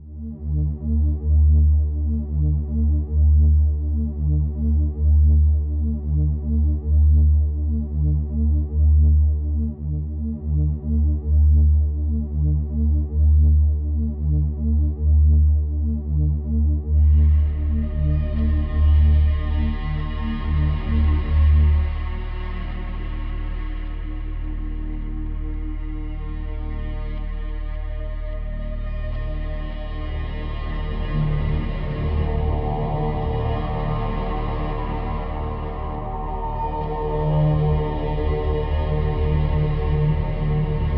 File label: Tutorial Music; Excubitor